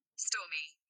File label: stormy